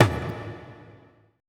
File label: ETH DRUM